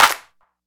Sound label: wet hand clap sound G# key; single hits one shots